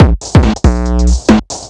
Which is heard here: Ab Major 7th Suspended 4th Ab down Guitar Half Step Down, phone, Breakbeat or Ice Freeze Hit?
Breakbeat